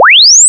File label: charge; DroidQuest